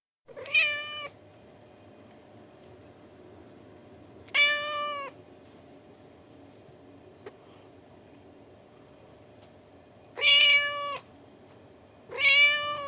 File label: Persian cat miaowing; Cats (Sound Effects:Animals); BBC